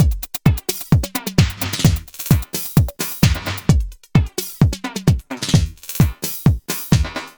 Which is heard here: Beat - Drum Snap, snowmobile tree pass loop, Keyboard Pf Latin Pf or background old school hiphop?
Beat - Drum Snap